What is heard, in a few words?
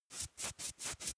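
makeup stroke apply sequence fast, bathroom; haus-garten; badezimmer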